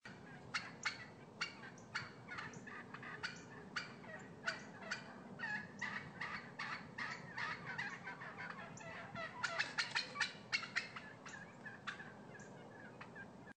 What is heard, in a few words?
Cackling Hen